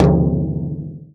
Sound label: BASS, INDIAN PERC